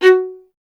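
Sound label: STR VLA F2, strings viola; Miroslav Vitous; Solo Instruments VOL-1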